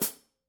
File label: signature precision 14 heavy hi-hat chick, cymbales; Paiste